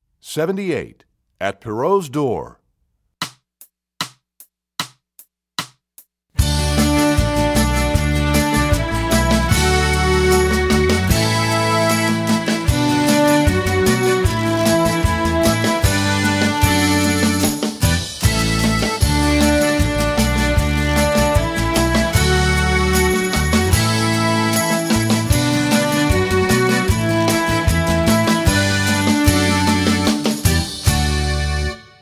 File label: At Pierrots Door, strings; EE2000 Book1